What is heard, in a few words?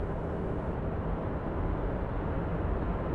ambi in; Mafie 1